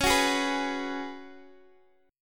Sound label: C sharp 7th Suspended 4th, C sharp, Guitar, Open D; down; chord shape